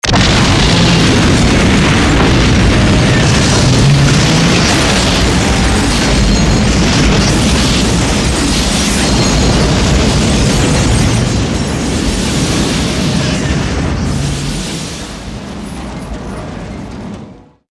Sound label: big explosion and destruction